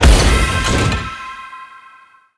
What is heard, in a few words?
crate open